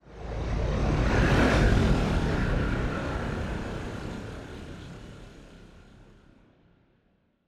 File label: ship destroyer move; Stellaris; machineage